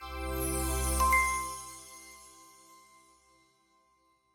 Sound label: Startup